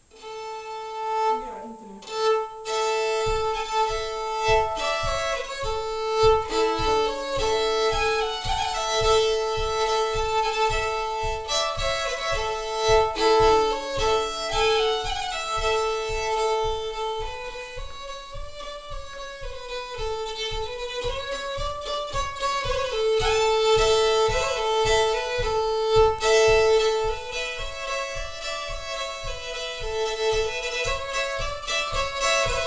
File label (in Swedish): Dals Polska; efter Ola i Skarup